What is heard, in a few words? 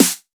TR-909 Snare, Roland BK-5; Snares